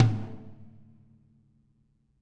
R B Rack Tom A Close; Sonic Foundry (Sony Creative Software) - Drums from the Big Room The Mixes; R&B One Shots

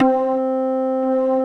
SYN C5; Best Service Gigapack 1

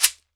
SHEKERE, Drums&Percussion

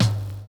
OLD LP, Kitch 70s; Univers Sons

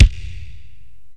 BD, Dance Mega Drums, HIP

